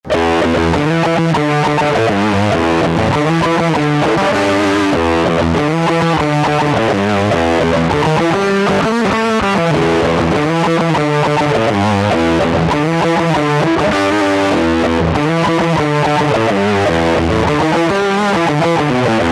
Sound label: FUZZ